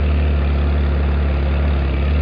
MOVE WHEE; fallen

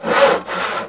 Work Sawing, Ambient; PC Gamer IT CD 46

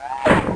Rearm; Big bang

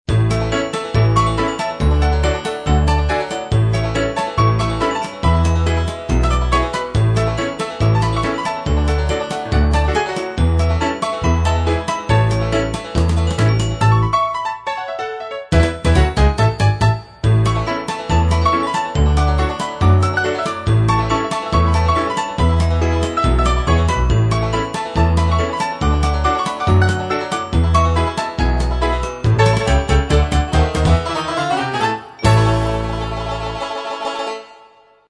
level 9; run

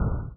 hum out; Bonzala; Revista CD Expert 50

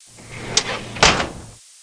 File Cab

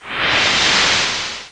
Transition; Apeiron

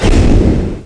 impact

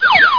slide; casino

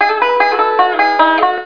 banjo; DR BRAIN; BUGS

